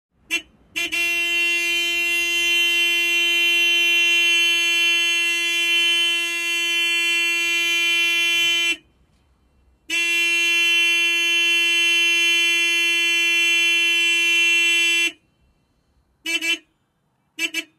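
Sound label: Car horn sound, klaxon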